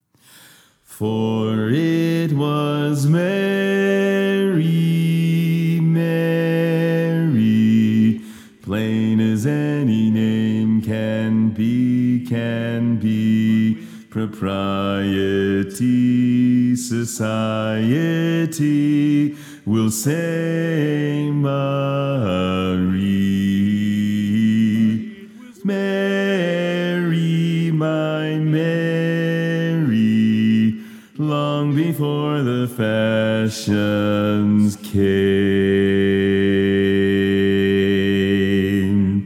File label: Bass